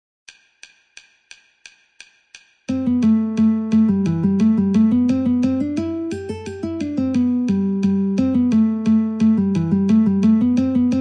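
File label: Guitar metronome 88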